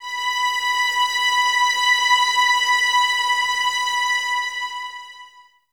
Violins B 5, String Ensembles; Light; Miroslav Vitous; 11 Violins

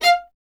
STR VLA, Solo Instruments; Miroslav Vitous; Solo Instruments VOL-1

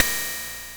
cymb, cymbals

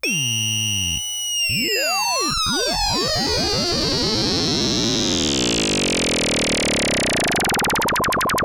Moog G3; Hollywood Edge; Giorgio Moroder Rare Synthesizer Collection; MLTI.MOOG

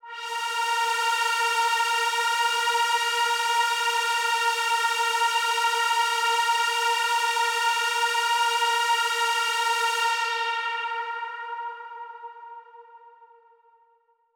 Big Pad A#3; KG MegaSaur

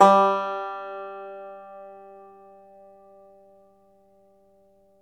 FLATTOP, Flat Top Banjo

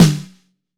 MAPLE SNARE

